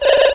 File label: Text wipe